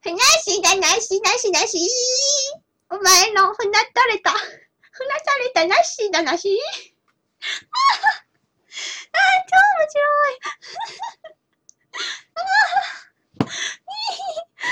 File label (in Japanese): 真似 笑い; ふなっしーの真似